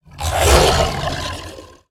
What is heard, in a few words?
Wailing Demon Attack